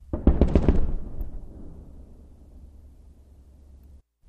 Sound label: Machine Gun Lrg Rapi, bursts